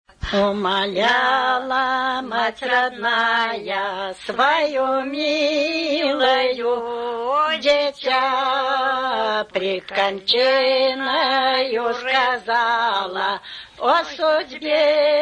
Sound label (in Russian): Исполнительница из Прейльского р-на. Латгалия. Умоляла мать родная; В Латгалии называют стихом